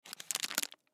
Cupcake Wrapper Snack Junk; Food Sound Effects; Household; Foley

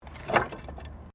Wood Move

